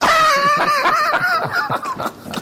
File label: Laugh; why are you gay